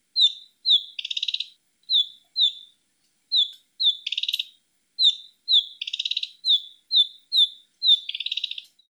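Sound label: narcissus flycatcher call, birds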